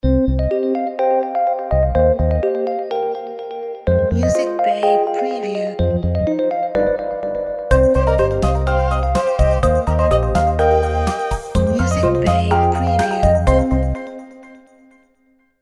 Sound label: Chinese Gold Coins